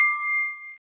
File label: glass water